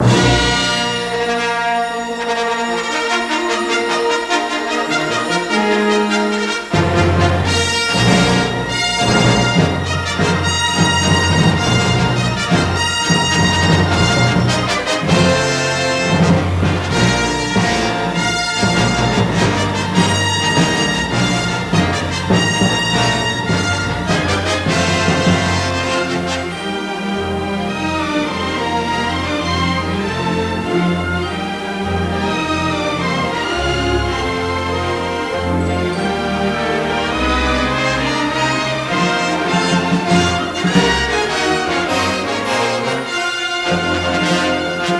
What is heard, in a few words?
theme music; cstrike